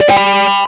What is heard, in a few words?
message; jam; stereo